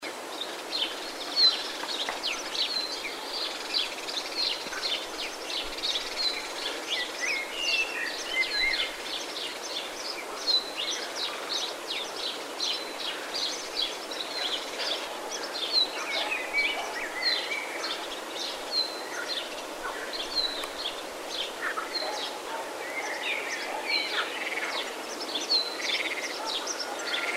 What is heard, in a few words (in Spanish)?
Ambiente del caserío; estuario sup oka